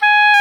WND OBOE Dry; Roland LCDP04 Orchestral Winds; Oboe 4-8; Oboe Dry 6

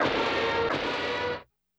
HIT, Hip Hop and R&B Drumloops; Best Service ProSamples vol.01; AKAI

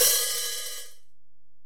HiHat, Alesis DM-PRO

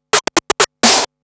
Scratch Fills 140BPM

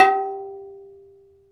PERC, Dance Mega Drums; Best Service; PERC MIX

